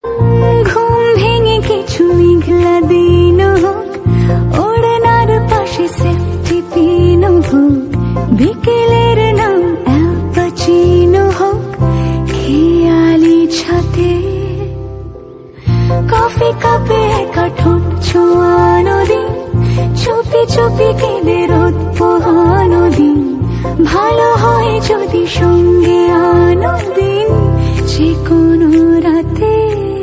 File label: bengali ringtone